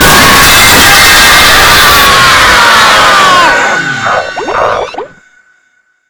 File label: jumpscare